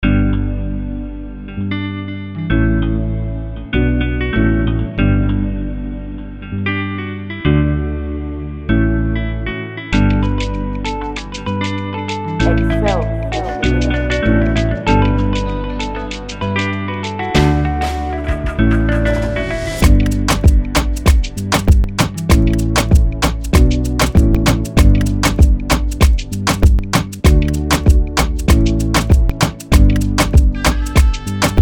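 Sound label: Afrobeat instrumental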